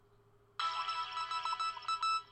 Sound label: Message Received